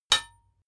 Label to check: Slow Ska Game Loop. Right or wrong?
Wrong